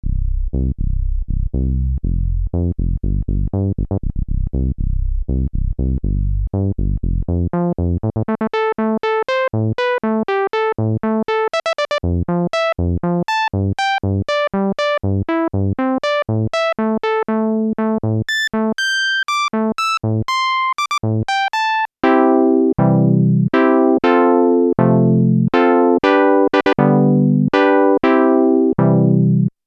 Roland SH-201 filter, lpf24 q000

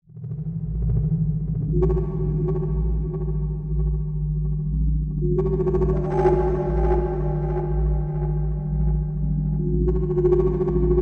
kawai k5000s sounds 4x

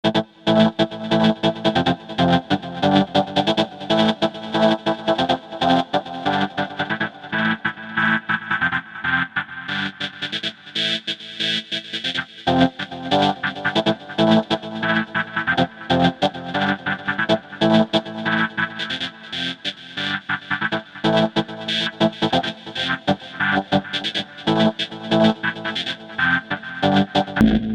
korg wavestation sr filter filterfx; Korg Wavestation SR Pure - II

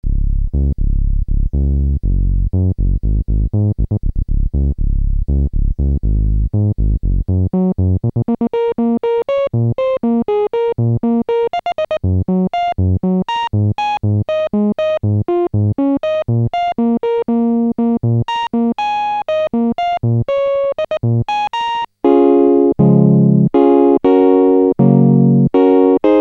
ensoniq mirage dsk-8 filter demo lpf

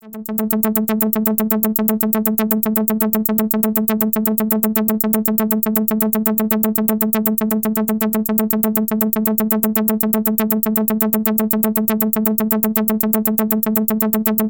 redsound darkstar sounds, midi timing problem

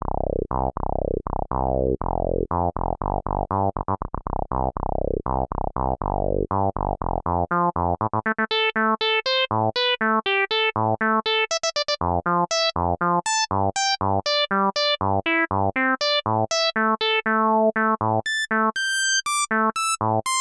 technics sx-wsa1r filter lpf24 q-100

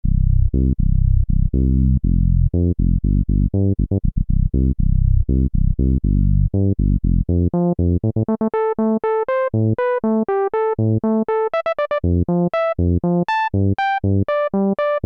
e-mu virtuoso 2000 filter steeper lpf 6pole q000

